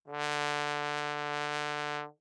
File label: Bass Trombone ff D3; MIS Pitches - 2014; Brass